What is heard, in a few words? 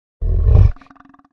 kodo beast attack